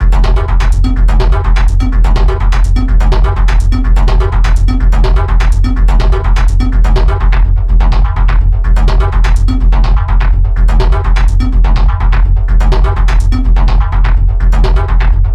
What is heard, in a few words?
125 kit loop full F